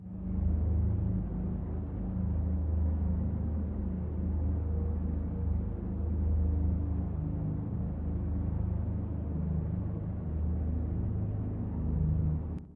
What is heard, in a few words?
ambient dark; STALKER